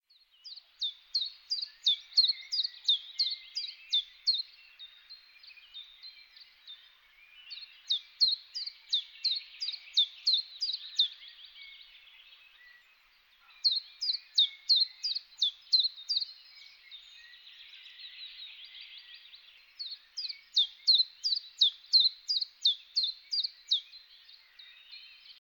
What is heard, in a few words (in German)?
zilpzalp